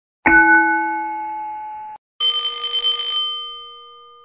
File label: Schell bell